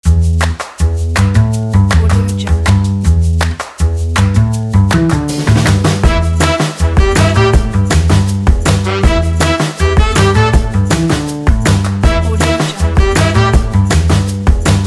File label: quirky fun retro upbeat